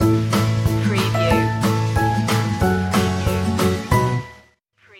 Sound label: sassafras stinger